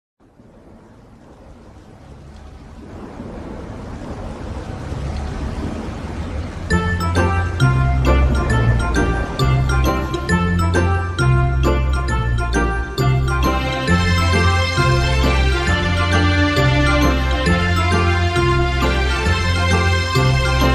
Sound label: INSTRUMENTAL; Le chant de l'eau; Club des retraités Rabelais 32